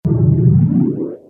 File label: lift end, movers